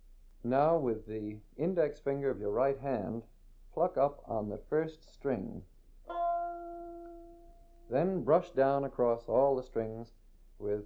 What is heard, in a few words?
Basic Strum, 5-String Banjo; How To Play the 5-String Banjo